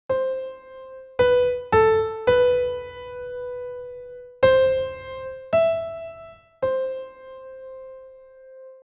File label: Rhythm Example